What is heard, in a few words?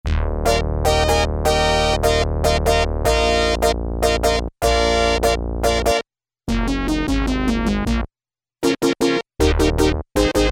SYNBRASS, Yamaha DX7